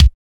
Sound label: 2000s snappy kickdrum sample d key, steel kick drum sound tuned to the D